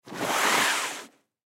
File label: Lacrosse Equipment; Lacrosse Equipment Bag Slide Cement Short; Sports